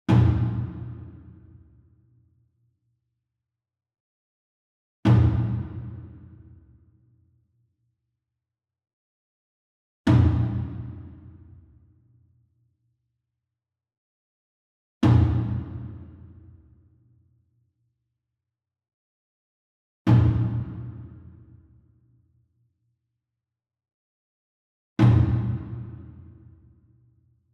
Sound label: ENSEMBLE DRUM Hit Far; boom library; Cinematic Strikes Construction Kit